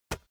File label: basketball single hand catch gentle